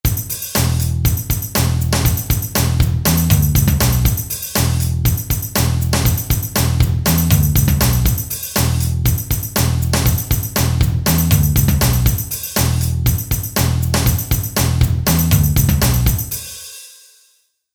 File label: Hard Rock